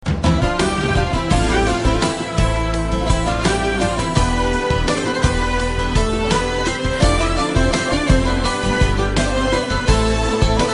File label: Ringtone Mobile